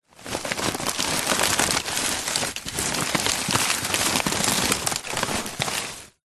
household large green waste garden bag full plants foliage light movements